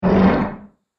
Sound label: Horror Beast One Shot, monster